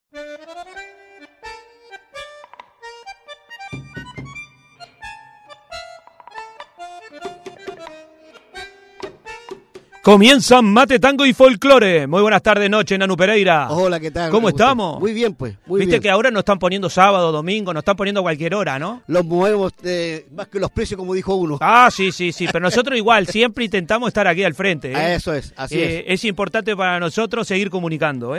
Tango Folklor; Mate

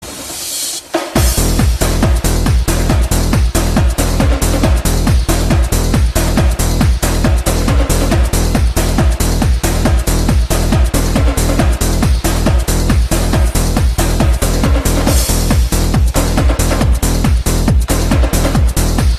Tambores

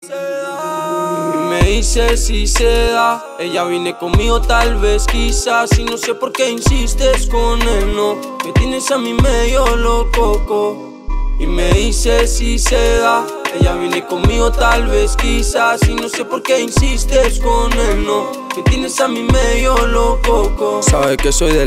Mariachi

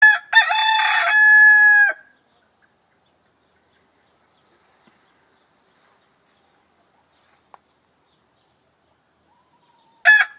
Canto del gallo

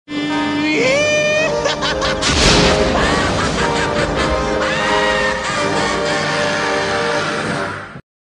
Risa malevola

